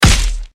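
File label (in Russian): увесистая мощная пощечина разозлившейся женщины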